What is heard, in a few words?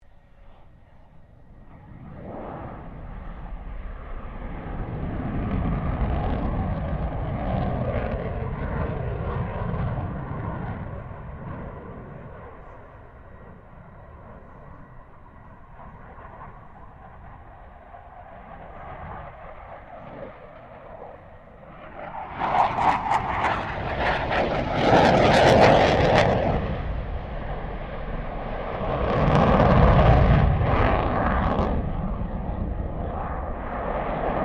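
FA-18 Hornet maneuvering; RAAF FA-18 Hornet; Airplanes; Vehicles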